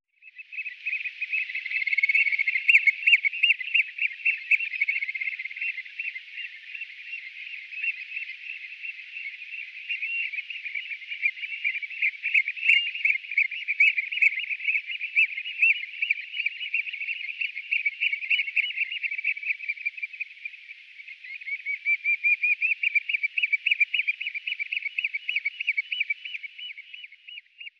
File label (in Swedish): Strandskata, Faglar